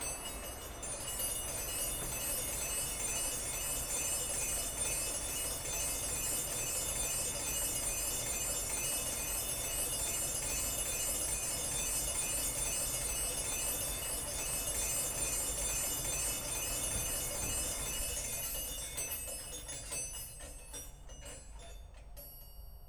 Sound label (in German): zimbelstern kurz